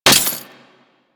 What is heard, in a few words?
Melee Impact Glass; Warframe; Weapons